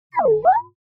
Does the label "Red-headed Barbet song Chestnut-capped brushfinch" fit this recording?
No